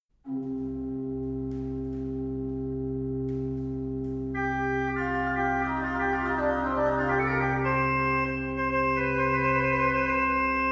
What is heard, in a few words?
Echo; NIVERS